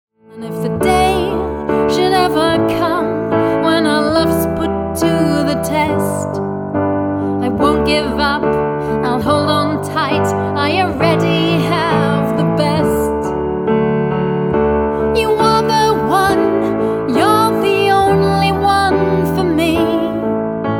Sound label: piano